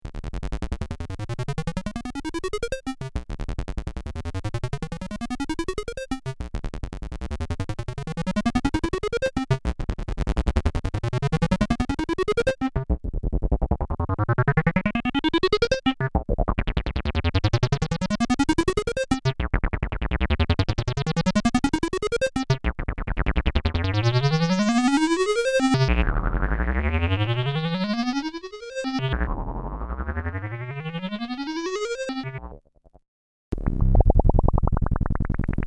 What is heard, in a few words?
fast sequencer filter env modulation